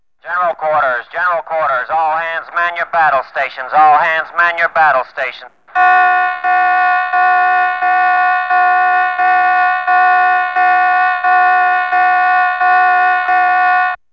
alarm

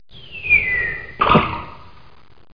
well

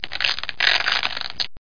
fasten

